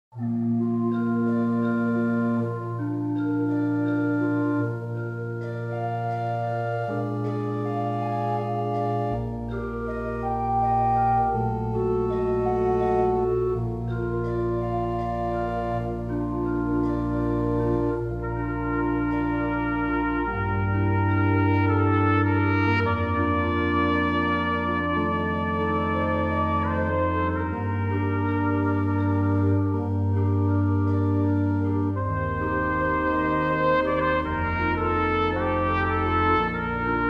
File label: Bb flugen